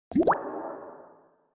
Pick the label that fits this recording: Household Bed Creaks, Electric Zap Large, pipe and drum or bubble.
bubble